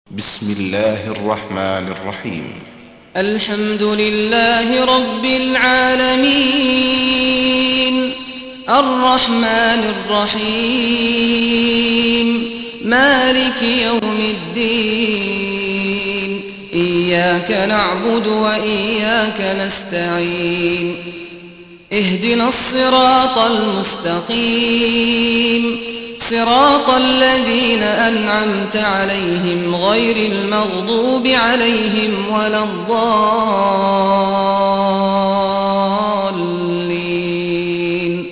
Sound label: quraan faatihah; sad ghaamadee